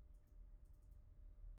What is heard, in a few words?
mouse; IEEE AASP